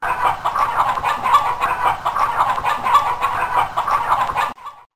Chickens

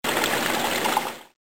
fountain

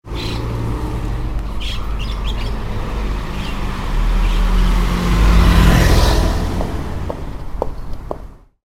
bird car foot

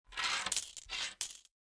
counting coins

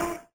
beep err; Mono

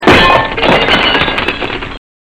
break

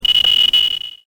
glass

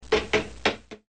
TUBE TAP

